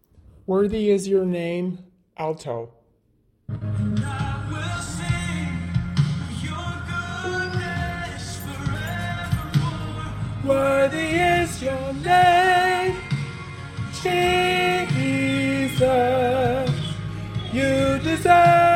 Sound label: alto Db